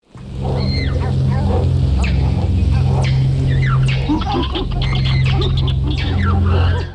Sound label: jungle